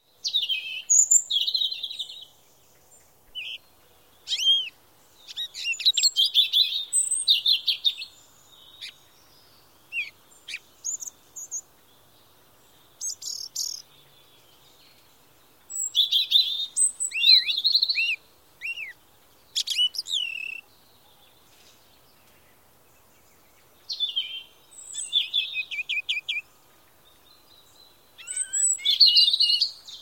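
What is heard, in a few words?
birdsong sounds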